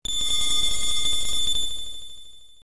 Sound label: curative item; ff3us